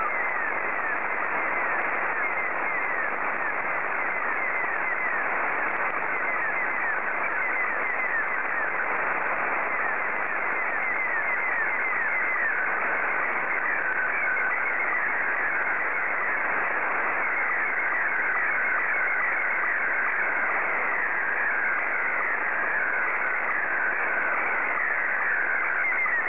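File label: SSTV, Scottie 2; JA3OEN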